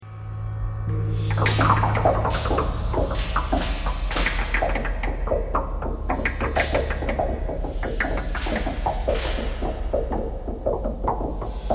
knocking thread noise all

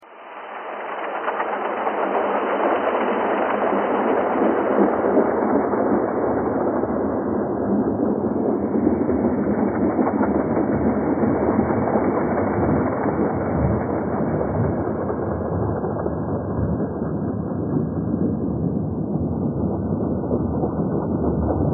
thread pentatonic

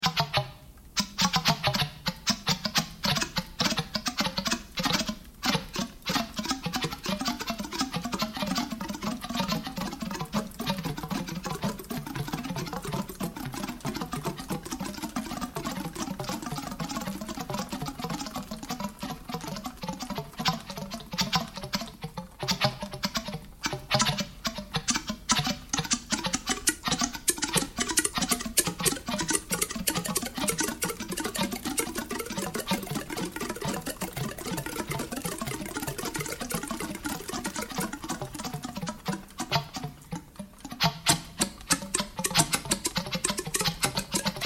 thunk thread noise all

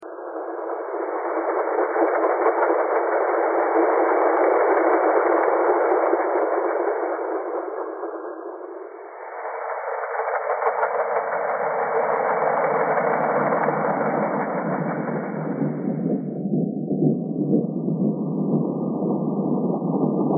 thread pentatonic

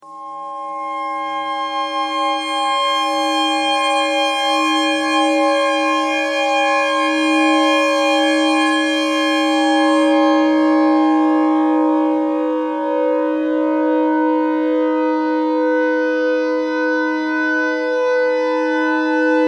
clarinet notes e thread noise

